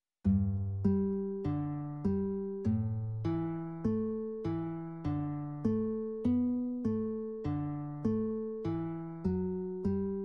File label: accomp; beginner classical guitar lessons